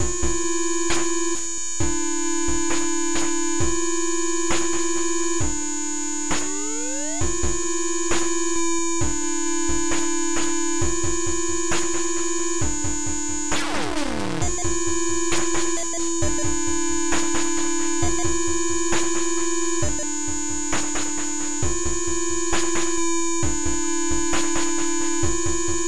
BEAT

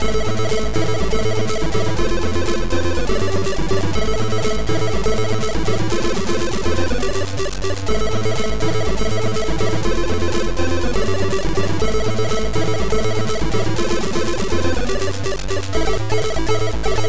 impovisation; Titus; Laser Dance

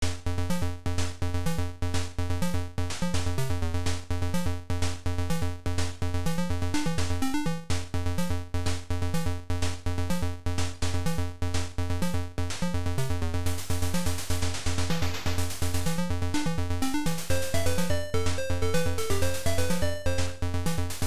Title In Game AY; Tarann Ltd; Jon Paul Eldridge; Afterburner

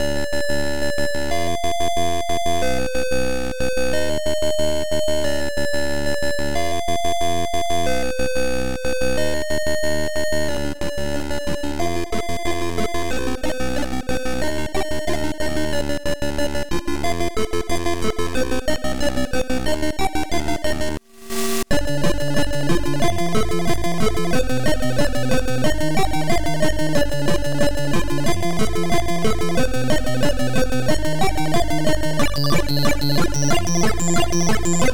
Soft techno

